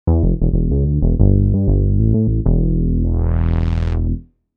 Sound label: Smooth Bass, V-Synth